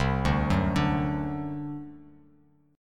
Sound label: C Suspended 2nd Flat 5th C down Bass Standard; Csus2b5 arpeggiated